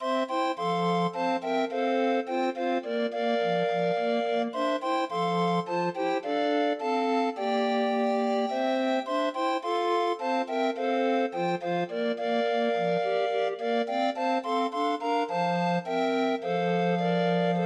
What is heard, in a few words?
Sing Hosanna, Grind Organ, Demo of 20 note MIDI file